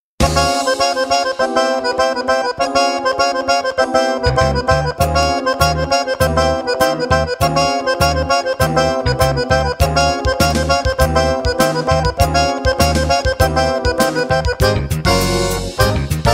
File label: jazz time